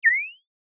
ヒュイーン; 【効果音】ヒュイーン

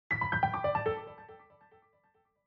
謎か解けた

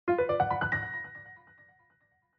謎か解けた

